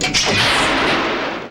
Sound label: Pickup Underwater O2 Tank; SFX Kit; Pickups